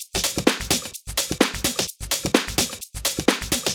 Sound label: House loops